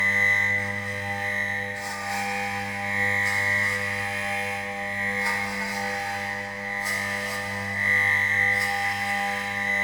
Light Glow Loop; Warframe; Duviri; Ambience

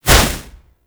Fist Whoosh; Warframe; Mummy

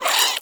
Vocal Attack, Hunting Critters; Nexifera

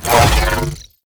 Kinetic Plating Disable; Warframe; Runner

